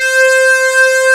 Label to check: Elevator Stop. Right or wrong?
Wrong